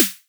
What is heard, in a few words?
snappy jungle snare one shot